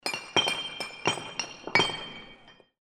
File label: granite